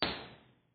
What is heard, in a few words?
IR Sweep